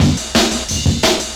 175, Breaks; EZRollers

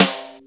SNR; Club-50 - Foundations Roland; Cheez Snrs

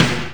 TURBO SNARE

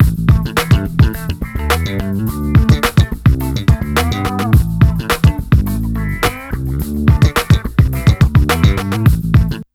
SLAPPY; USB Soundscan vol.31; Miro s Grooves; AKAI

